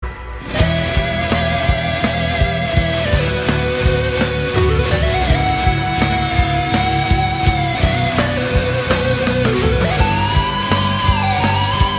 music brawl round start 95bpm; Risk of Rain music pack; in-game music

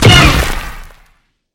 wall jump; wall jump trick

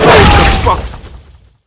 pillow hit; familiar; rem sounds

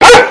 stickybomb attach; bark fart; bebop; bark fart modpack

